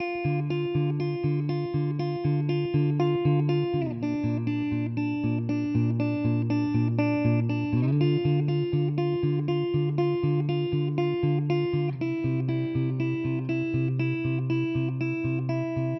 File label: Guitar Loop 120BPM Dm, chords & melodies; 99Sounds; Monosounds; Music Loops; Instrument Loops; Guitars